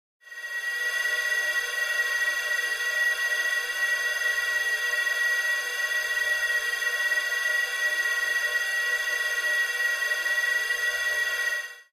Laser Saw, laser fx

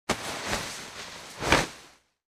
Grabs Heavy Cloth

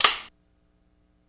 Switch Click; switches

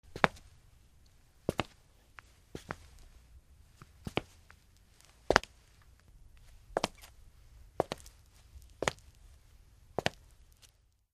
Footsteps Female

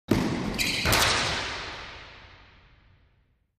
Racquetball; Body Runs Into Wall

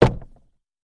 Carriage Footplates

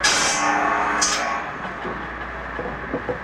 Sawmill Blade Off Th

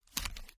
pager holster

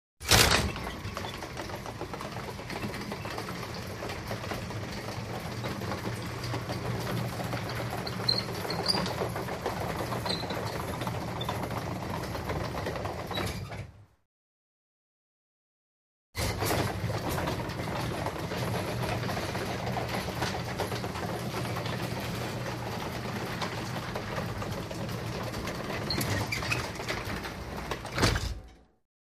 Garage Door, Electric, Open / Close

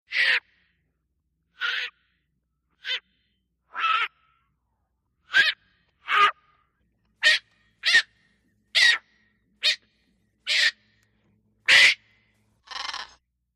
Parrot Squawks Vario

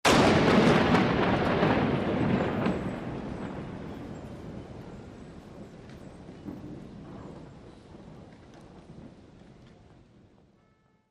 City Thunder Sharp

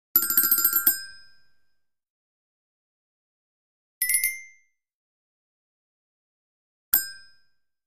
Ferry Dings; Ferry Dings 3 Ferry

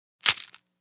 Candy Bar Crunch Bite

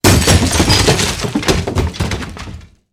Body Falls On Debris

